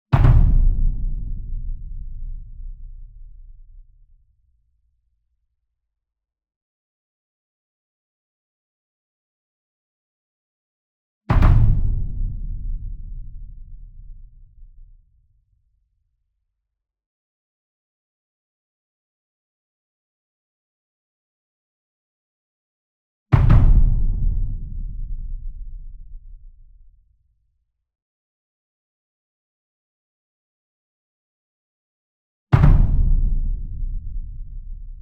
SINGLE DRUM Verdi 66 Inch Double Soft Beater Near; boom library; Cinematic Strikes Construction Kit

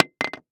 Test Tube Set Down; Medical; Blastwave

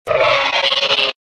Velociraptor - Cry; RadwinIsland

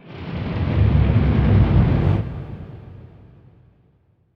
NOIS; XXL 800 Production Effects

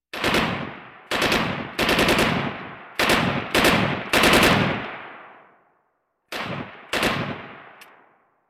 Walking Machinery Trucks

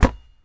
Street Ball Rebound Pull; SFX Kit

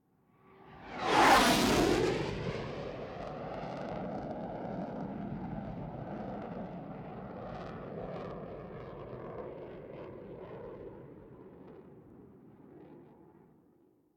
Afterburner flyby; KMP Film Video Sound Effects

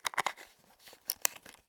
bottle close1, household; NS Studios - ultra sound collection

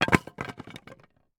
IMPACT Wood Plank On Wood Pile Stick; mono; IMPACTS; Universal Sound FX

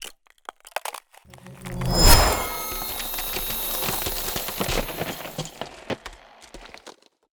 Ice Spell Whoosh, Cold Whooshes; Arctic Aura; FrostBuster

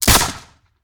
Musket; Sound Ideas; Guns

